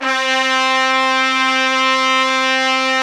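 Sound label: trumpets section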